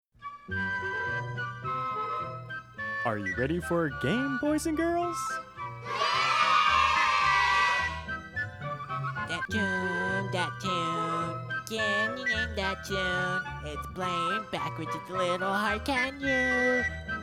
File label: game jingle